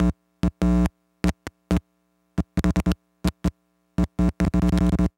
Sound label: Technology Electronic Electric Arch Intermittent Short